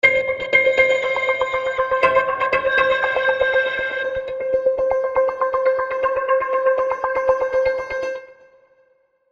Rhythmic Pedals STR High; Gravity 2

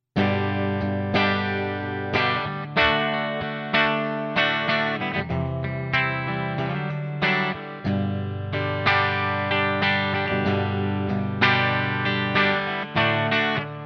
Epiphone Les Paul 60s Bridge PU Clean

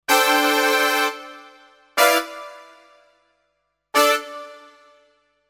Hi Brass

